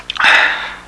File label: relief; People; Sound Library 2000; Wayzata Technology